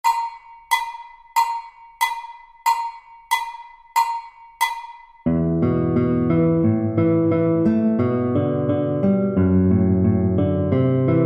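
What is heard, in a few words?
Meadow accompaniment, guitar; B string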